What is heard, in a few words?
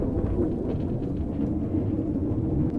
ball; ships Combat